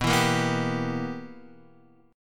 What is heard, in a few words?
B Major 7th Suspended 4th Sharp 5th, Guitar Open D chord